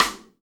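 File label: ambient rim, Acoustic kit; Akai MPC2500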